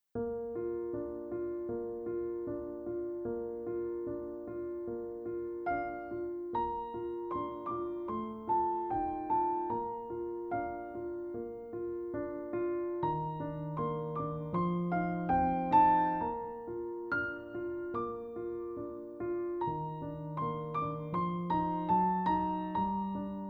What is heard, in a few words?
Piano Collection; Katawa Shoujo